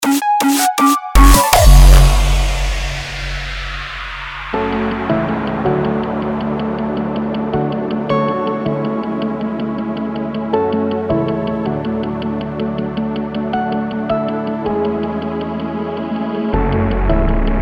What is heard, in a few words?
Rawstyle